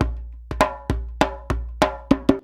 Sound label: 100 JMBSOUL; Univers Sons; Basicussions